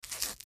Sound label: MedicalScientific; Soundfx III